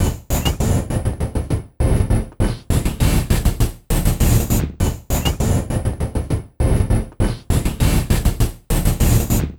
Envelope Beat; Drums & Drones

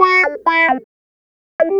Clavinet; Ambient Grooves

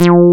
Audity Bass F3; Digital Sound Factory Xtreme Lead

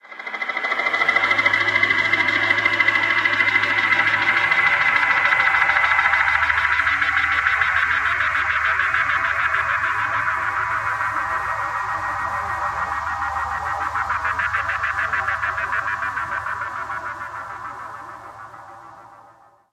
WALKING THROUGH WALLS, DEEP ATMOSPHERICS; Sonic Foundry (Sony Creative Software); Vir Unis Infusion

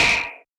Yamaha DJX 2B, SD Hard

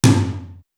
Rock Tom Lo, Pitched Up; Clavia DDrum-AT

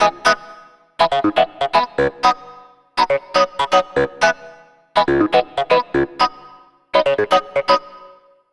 Clavinet; Dr. Fink's Funk Factory

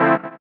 DR. LOOP; X-Static Goldmine 3; CHORDS

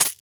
perc killa tam; neuro; Sinistarr; Drums

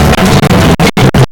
SEARCH LOOP, Big Beat; Big Beat Samples & More; AKAI; ALL LOOP KIT

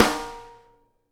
snare GLASS, Live Snares

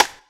Rimshot; Equipped Music; Loungin House

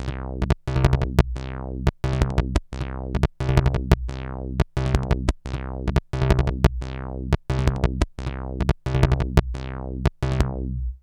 BASS, BASSLINES 175; Masterbits; Tekkno Trax

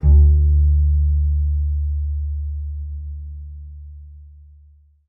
D2, bass pizz; Xsample VOL-5

